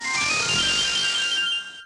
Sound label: 1up